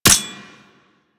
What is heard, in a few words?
Anti Aircraft Gun Mech C; Weapons; Warframe; Archwing